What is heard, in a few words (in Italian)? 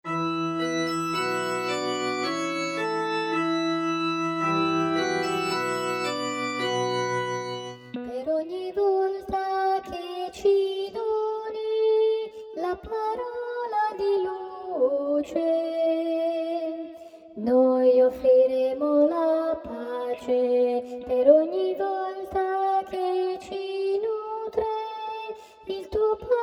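contralti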